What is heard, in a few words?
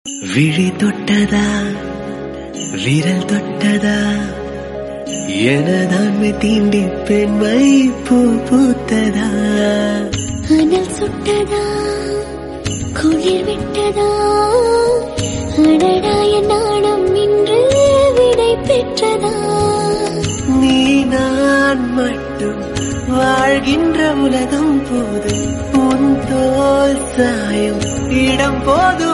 Tamil Love